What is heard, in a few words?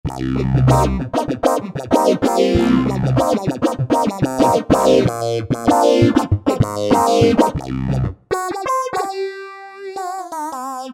Key Groovy Auto-Wah, Alesis Micron; Factory Programs